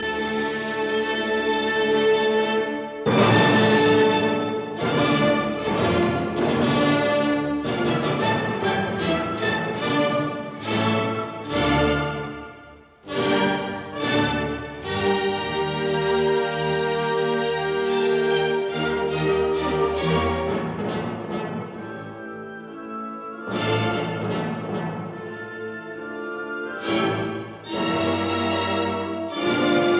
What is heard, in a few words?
Symphony in D minor Choral Allegro ma non troppo un poco maesto; Beethoven; Op 125